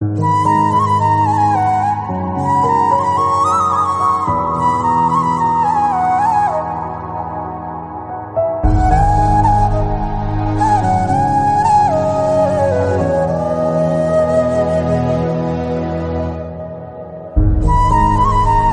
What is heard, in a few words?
telugu love failure flute bgm